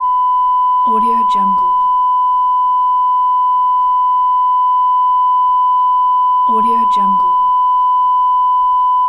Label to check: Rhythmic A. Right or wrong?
Wrong